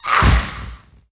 arch lich damage, monster

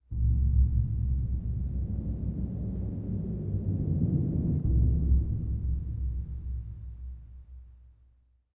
ruin, lake

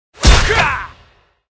night fighter attack, monster

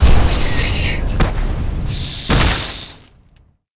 Boss Mech Griffin deth

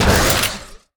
Mech damgup, monster; steamer crazy

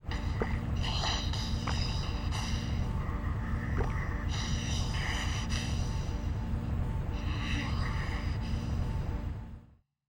beholder idle, monster

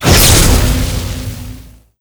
lightning trap; monster

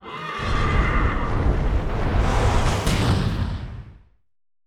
fallensteed atk, monster

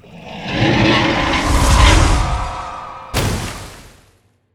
leviathan, monster

